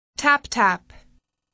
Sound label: tap tap